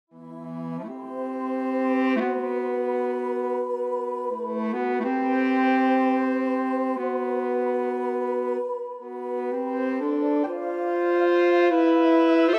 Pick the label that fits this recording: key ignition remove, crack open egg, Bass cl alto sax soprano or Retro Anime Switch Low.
Bass cl alto sax soprano